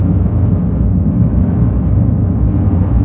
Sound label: Engine loop Distance